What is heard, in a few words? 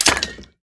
canister use; Original TK Sounds